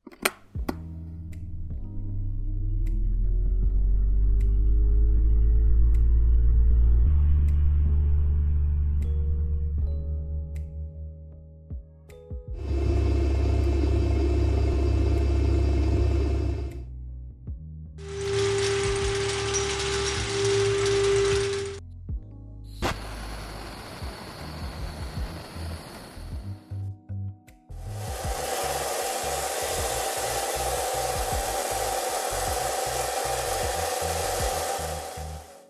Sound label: Industrial